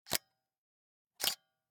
Camera, Pentax KS2, DSLR, electronic, mechanical, snapshot, exposure hold, release; Eiravaein Works; Vaeyan IV